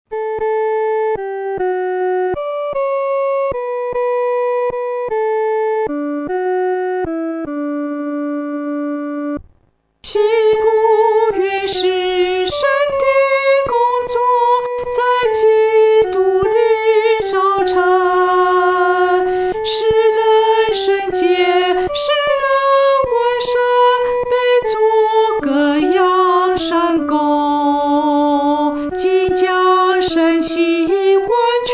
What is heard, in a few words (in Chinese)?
独唱 第一声; 信徒原是神的工作